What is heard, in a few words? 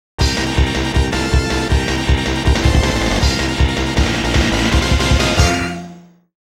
Round Clear; Taito Retro Game Music Collection 4; Riding Hero Cluster; Mega Powers!